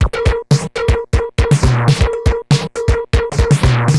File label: Distorted TR 505 (120BPM), beats